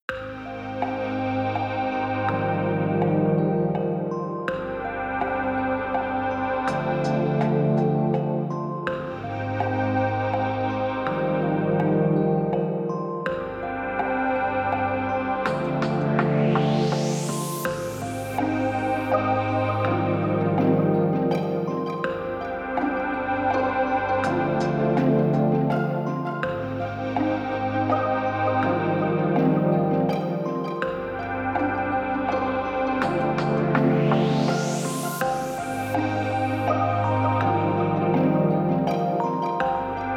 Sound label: Watery Music Box; Fire Emblem Fates